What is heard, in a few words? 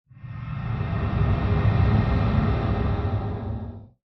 Swell Airy Rush Rumble Growl; Sound Design; Swells; Big Room Sound